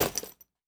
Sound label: Bag; Survival Game Sounds - Lite Edition; Objects and Items